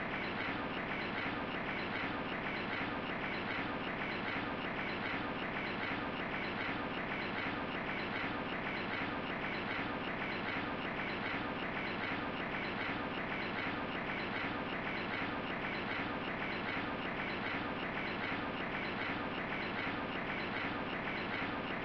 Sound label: Jungle Ambience; Timon and Pumbaa's Jungle Games; snes